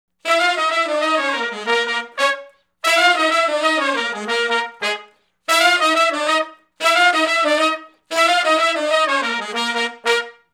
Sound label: R B Riff (Bb) unison, Horncraft; Sections